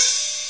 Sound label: open hat f sharp key